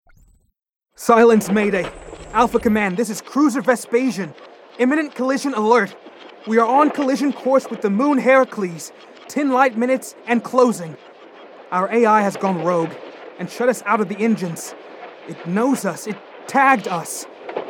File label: Distress Signal; Mothership; Mothership Distress Signals v1